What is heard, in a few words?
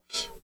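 96 MOD CYM-R, cymbal; East West - Phat & Phunky CD2; 90's Sample CDs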